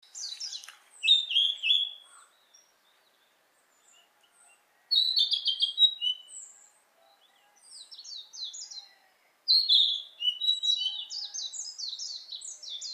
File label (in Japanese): kibi mejir near; audacityNR